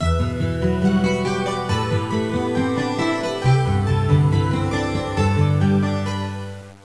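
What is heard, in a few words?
glass music; My Science Fair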